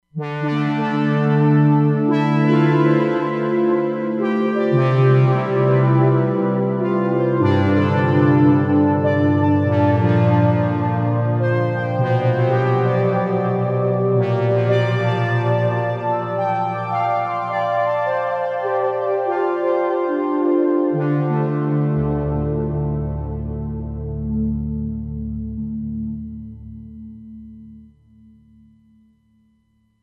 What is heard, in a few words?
Magnetic 5th, Roland Fantom XR